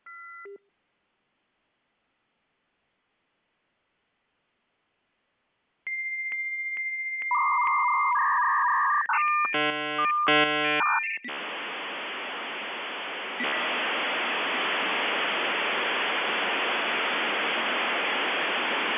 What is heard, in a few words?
aztech